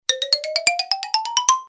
piano; disney; mashup

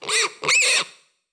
Squeal; Monsters University; Archie

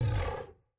creature; starwars; episode vii the force awakens

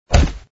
hit; marvel; city under attack

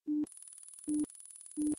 location loader animation; Cars

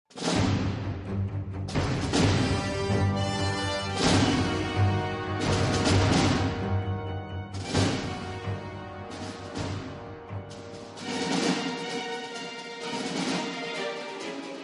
loop; avengers

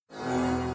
match 4; john carter; lost symbol

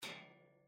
bird; starwars; episode vii the force awakens

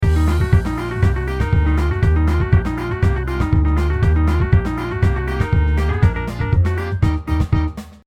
landing music; perry; perry sightings

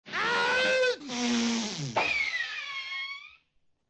sneeze; snow white; sneezy

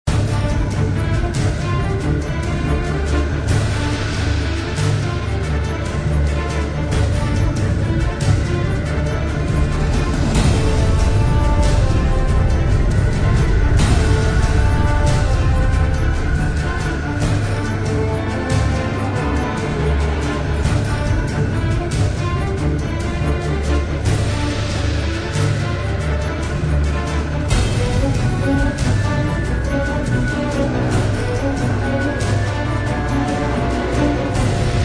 section loop; pirates3

